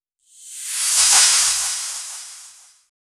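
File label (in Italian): un effetto di una transizione; circle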